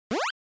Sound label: NES General Pitch